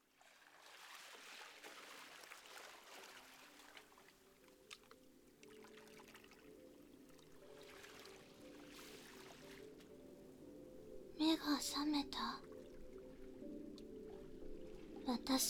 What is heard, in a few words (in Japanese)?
耳かき 心音; またやってきたのね